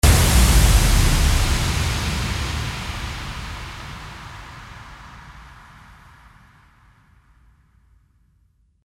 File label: IMPACT